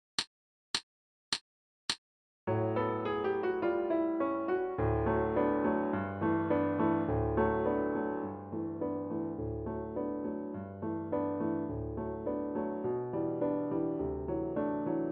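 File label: american lullaby accompaniment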